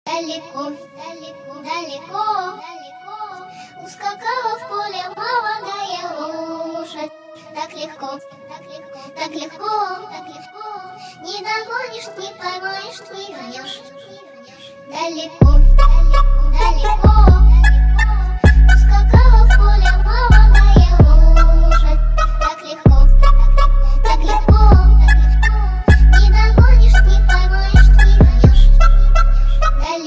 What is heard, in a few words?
instrumental